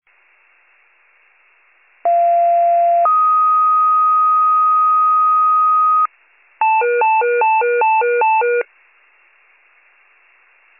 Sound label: fire, pager alert